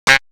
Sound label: Wind; 90 sSampleCDs; Coldcut's Kleptomania VOL1; Brass n Wind